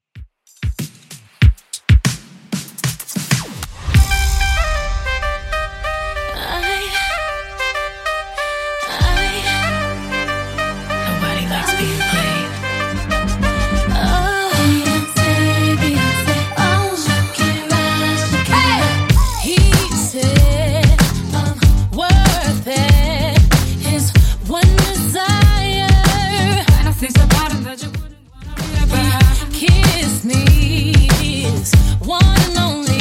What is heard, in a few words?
2ks DJ Friendly 95bpm CLEAN